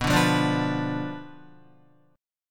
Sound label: B Diminished B down Guitar; Bdim strummed